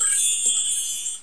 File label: Heal Act